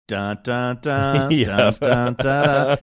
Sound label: singing riff